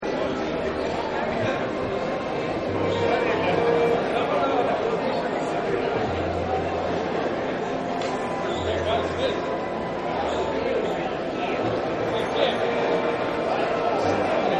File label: Big Crowd Music; Crowds; Backgrounds